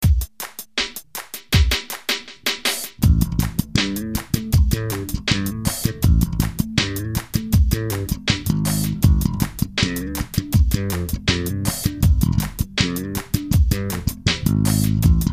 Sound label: slow